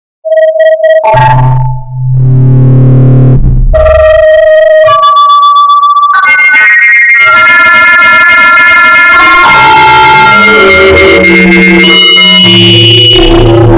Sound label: noise